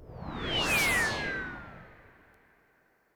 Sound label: FX Industrial 140